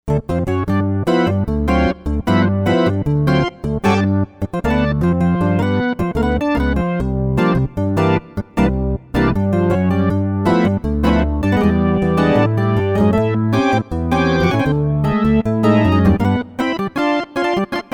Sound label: Synth Organ, Novation UltraNova; Factory Patches